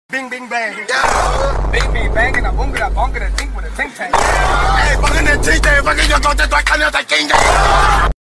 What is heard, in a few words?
bing bing bang